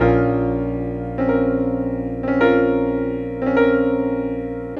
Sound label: piano, Loops Sad